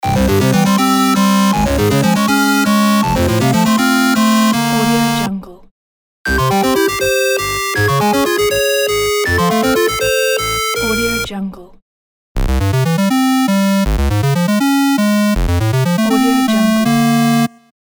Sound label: 8bit fanfare